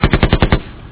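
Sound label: machine gun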